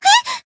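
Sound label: Voice damaged, Japanese; sincere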